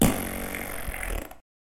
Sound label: Lawn Mower Fart